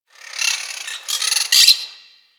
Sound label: Forest Creature Squawky High; Ambience; Warframe; Grineer Forest